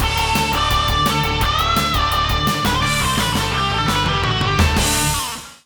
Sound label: weekend jingle